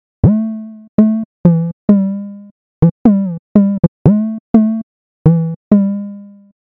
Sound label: whistle treble fade dist